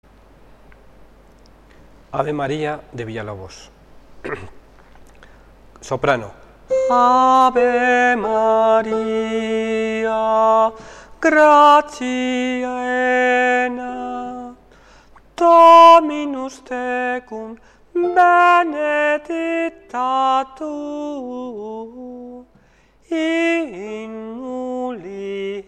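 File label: soprano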